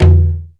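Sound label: Ethnic Perc, drum; Percussion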